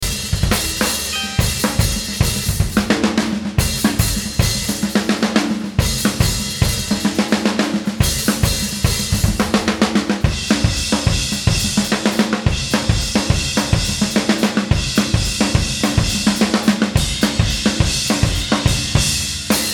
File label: Drums